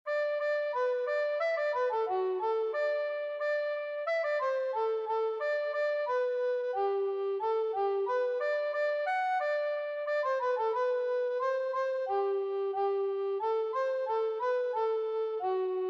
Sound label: Tenor Recorder; Horses Bransle